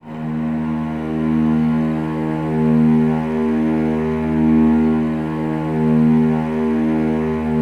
D#1, Celli; Best Service ProSamples vol.51; Classic Orchestra 2

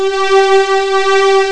STRINGS D50; D50 STORY; East West; Global Sounds

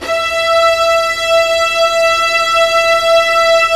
STR SEC, STRINGS; Roland - LA Composer vol. 1

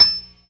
PERC, ORGAN; Keith Emerson Signature Series

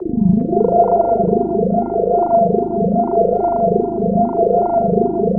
ATM SYNTH; Best Service - XXL Production Effects

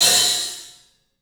club hat; 3D Sound; 3D Drums

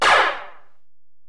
EPerc, Alesis DM-PRO

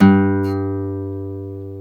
GTR Nylon, Roland; 90's Sample CDs; Club-50 - Foundations Roland; Nylon Martin; Nylon 1

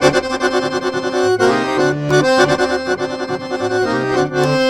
Balkanish Traditions; USB Soundscan vol.63; AKAI

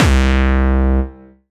Distortion Kicks, Bassdrums

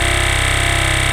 RAVE BEEP; Best Service Alpha Dance vol.2

